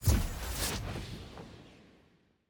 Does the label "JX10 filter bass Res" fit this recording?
No